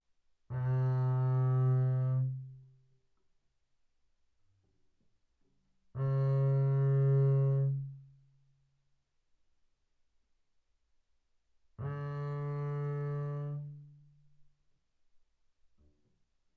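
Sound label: Bass arco sul A fortissimo C3 A3, double bass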